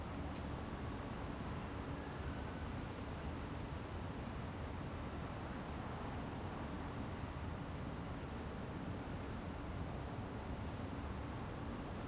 Urban; blue archive

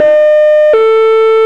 hilo din, sirens austria; code3 austria

